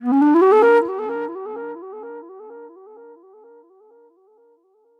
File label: NY 70's Delay Flute; Sonic Foundry (Sony Creative Software); Manhattan Flutes; Session 01 - Benedetta